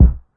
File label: classic hh kick; STYLE KITS (Hip Hop, Etc); Classic Hip Hop Mega Kit